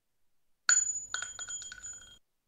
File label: rangedweapons bulletdrop; minetest